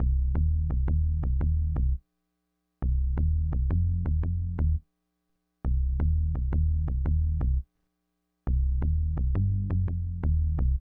Bass, 085 Cmin

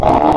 Sheep, Wavetables; Cymatics; Serum; Animals for Serum - Gold Edition

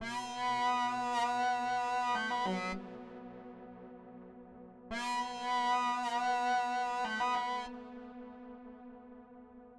Synth; Hip Hop; Big Fish Audio

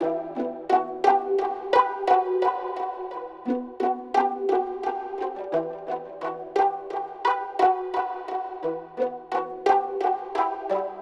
Strings, Hip Hop Exotica, 087 Emin; Apple Loops; Big Fish Audio

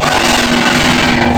Cobra, Wavetables; Cymatics; Serum; Animals for Serum - Gold Edition

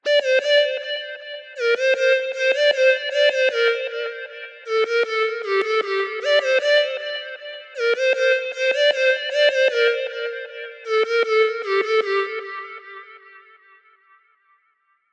155 BPM G Min Lead; Cymatics; Destiny Gold Expansion; Neon

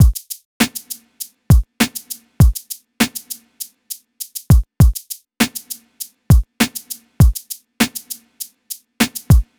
Drums, Hip Hop Exotica, 100 Dmin; Apple Loops; Big Fish Audio

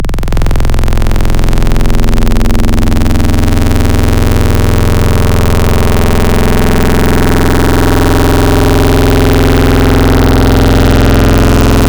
Phase Shifter, Wavetables; Cymatics; Wavetables Collection Vol 3; Serum

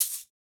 Shaker Hit; 102 Fmin

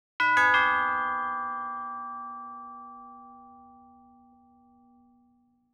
Bells, Hip Hop Exotica, 088, Gmin

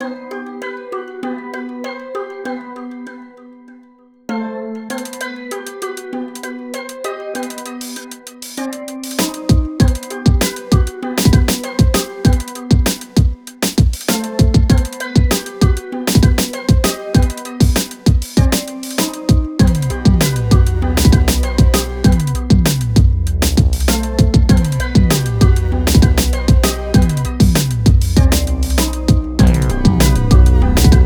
098 Bmin, Hip Hop Exotica; Big Fish Audio

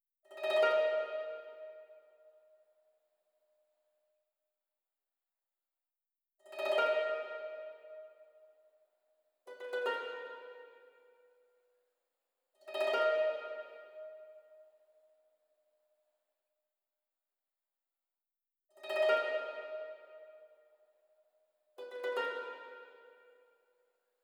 156 BPM G# Min Harp; Cymatics; Quest; Destiny Gold Expansion

